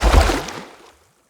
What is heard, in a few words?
Gallop Water, Animals, Foley, Steps; Bromius